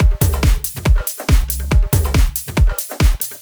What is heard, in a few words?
drum Loop 140BPM Full